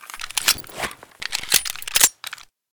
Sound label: tt33 reload empty